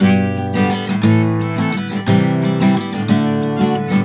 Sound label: GTR6, Celtic Flavours; USB Soundscan vol.42; AKAI